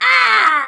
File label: pain; Alien Arena